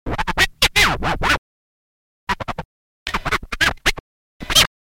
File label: Dj Puzzle Scratch